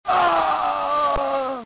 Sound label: groan; pulseman